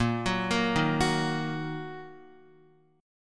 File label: Bb Suspended 4th Sharp 5th, Bb, down, Guitar; Bbsus4#5 arpeggiated